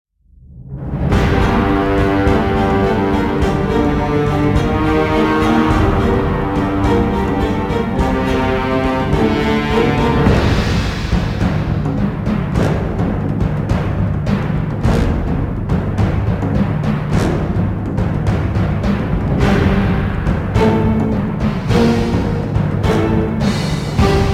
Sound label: combat